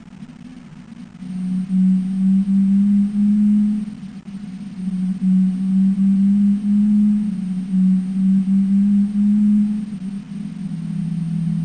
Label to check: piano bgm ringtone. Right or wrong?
Wrong